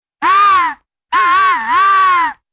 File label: high pitched train whistle